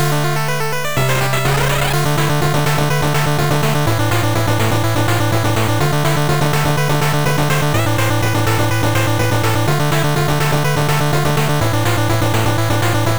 8bit Japanesque

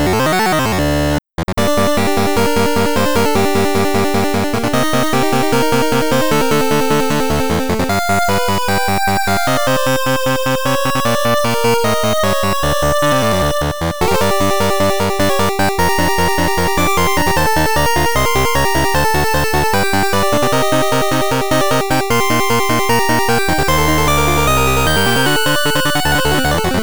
8bit Battle Theme

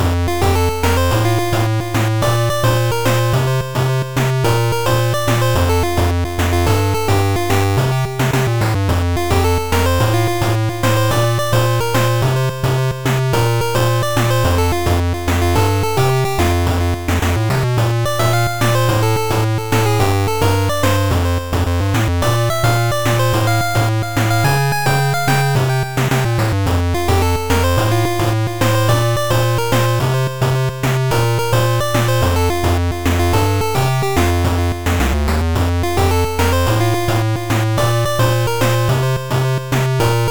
8bit Lets go

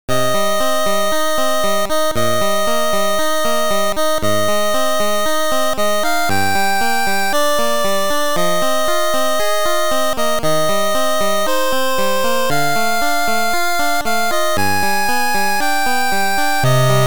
8bit Requiem

